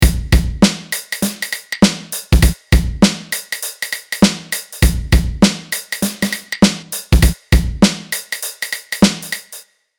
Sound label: Drum Kit 100bpm; MIDI; Unison MIDI Starter Pack